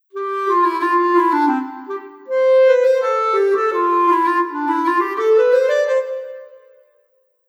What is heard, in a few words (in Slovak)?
klarinet